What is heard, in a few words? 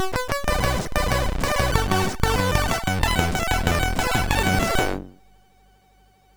sid MOS6581R3